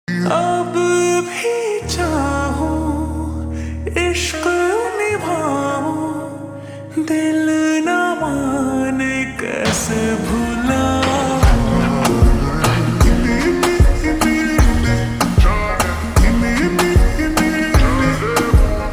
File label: slowed reverb